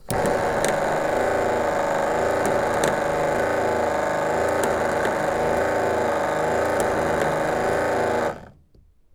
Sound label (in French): MOTEUR ESSUIE-GLACE